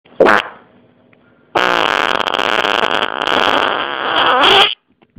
Rotura y resquebrajo, pedo

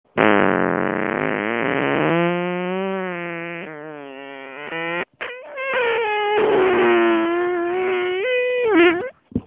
Pedo de madrugada

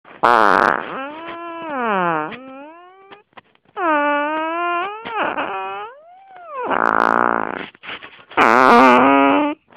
Pedo; de la bisbal de falset

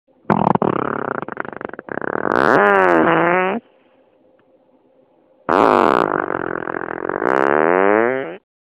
Acelerón y fuga, pedo

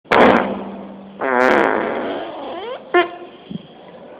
Nota final con resonancia, pedo

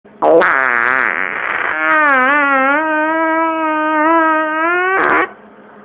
Clásico con soniquete, pedo

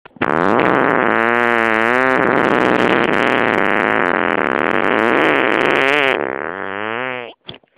Pedo descarga

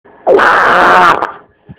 Pedo; de Arinsal

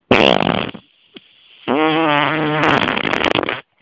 Con gas y saña, pedo

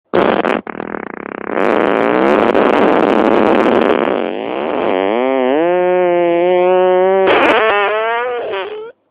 Pedo multitodo

